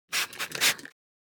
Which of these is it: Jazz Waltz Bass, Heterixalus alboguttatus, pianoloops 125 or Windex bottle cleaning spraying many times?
Windex bottle cleaning spraying many times